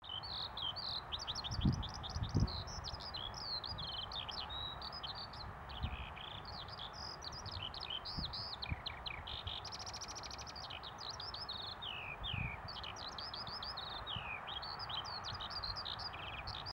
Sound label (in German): Stimme der Feldlerche